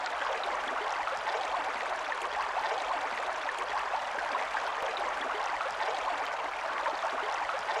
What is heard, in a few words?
bubbling brook